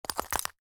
step; tungtung cursed; tungtung sahur curse

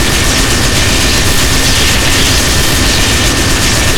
gatling shoot crit; tf2

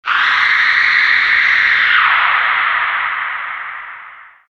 female scream; Garry's Mod; tf2

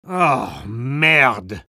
spy jeers; vo

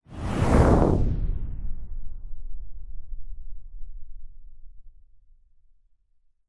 warp; skbt astro fleet

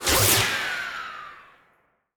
resistance heavy; Garry's Mod; tf2

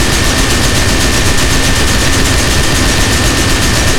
gatling shoot; tf2

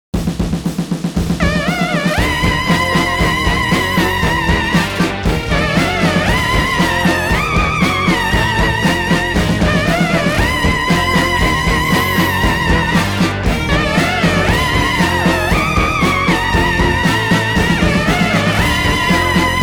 end last wave, music; Garry's Mod; tf2 content; mvm

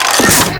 reload; cow mangler

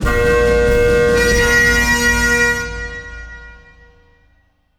medieval door close; Garry's Mod; tf2

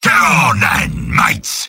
battle cry; sniper; mvm

